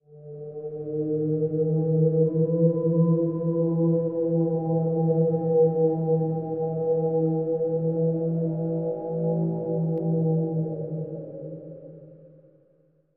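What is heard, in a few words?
Animal Stinger Eerie; stingers & whooshes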